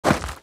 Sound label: foot dirt, footsteps; midnight guns